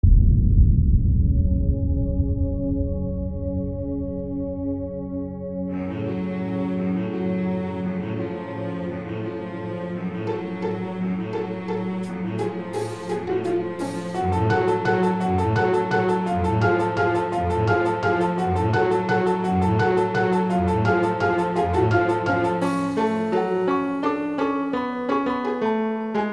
the bird and the worm piano

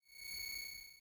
Monster; Zenless Zone Zero

